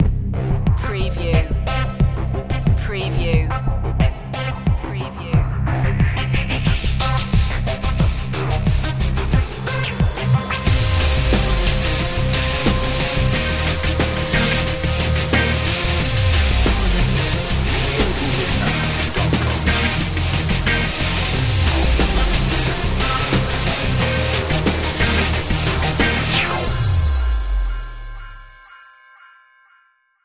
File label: slow jam rock